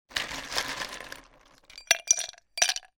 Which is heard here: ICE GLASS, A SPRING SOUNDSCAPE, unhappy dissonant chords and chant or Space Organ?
ICE GLASS